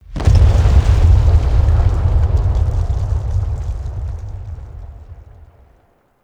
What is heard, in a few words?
Large Arms Move Stop; Ambience; Gameplay; Warframe; Duviri